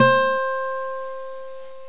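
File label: acoustic guitar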